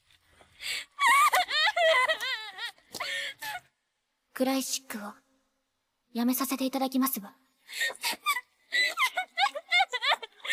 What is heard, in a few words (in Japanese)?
祥哭 vocals; BanG Dream; Mujica